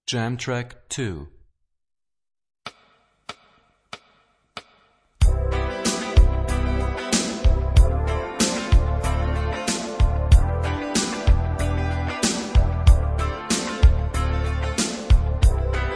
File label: Rock guitar; Rock gt Secrets